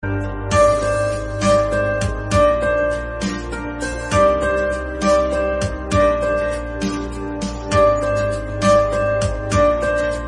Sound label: BGM Ringtone